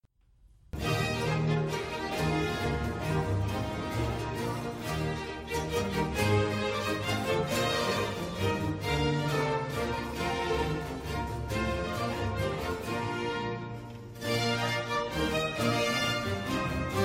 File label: Hornpipe; Purcell; Amphitryon